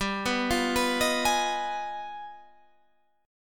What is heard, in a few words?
G 7th Flat 9th Sharp 5th, chord